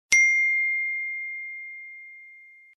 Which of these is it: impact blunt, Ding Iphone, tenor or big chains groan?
Ding Iphone